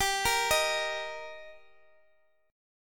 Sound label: G Minor Sharp 5th G Guitar Standard, chord; arpeggiated; JGuitar